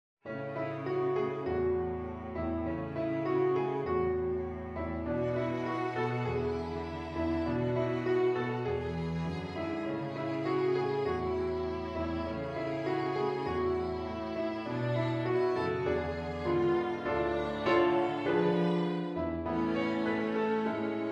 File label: Jingle Bells, piano trio; arr. by Ilan Chouraki